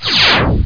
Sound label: zing; Bonzala